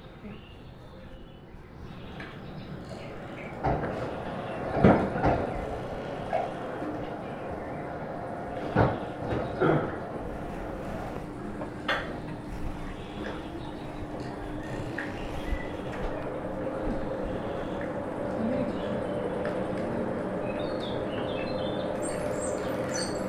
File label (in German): bootsfahrt; Eine Reise in die Dunkelheit